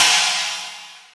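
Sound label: R8 crash; X-Static Goldmine 1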